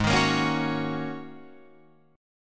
Ab 7th Suspended 2nd Suspended 4th down Guitar Open F; strummed